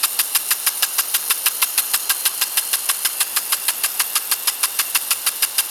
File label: sprinkler